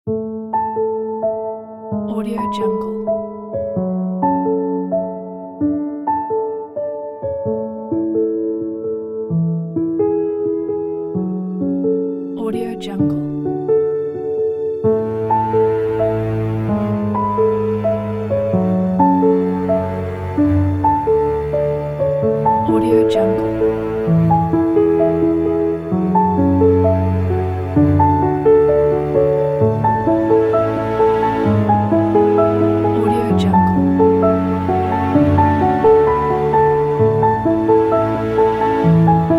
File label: romantic